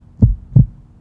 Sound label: heartbeat, weapons foley; ModernWarfare